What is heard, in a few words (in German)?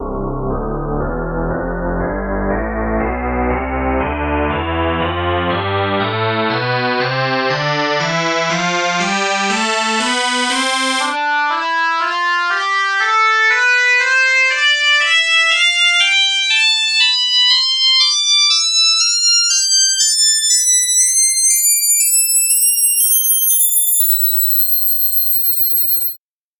bagpipe tonleiter